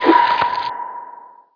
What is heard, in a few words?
mr scream; mystic radar